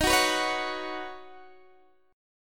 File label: Eb Minor 7th Sharp 5th Eb Guitar DADGAD